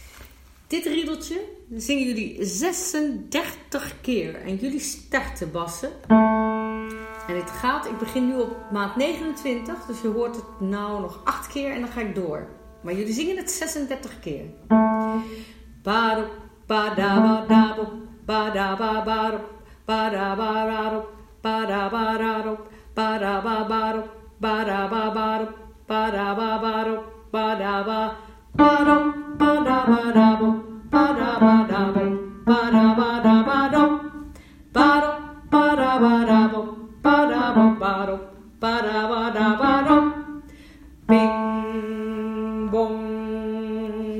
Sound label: Bas jazzy Bells